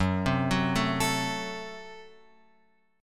F sharp Diminished; F#dim Chord {2 3 4 2 X 5} arpeggiated

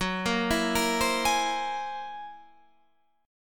F sharp 9th Flat 5th F sharp Guitar Standard; arpeggiated; JGuitar

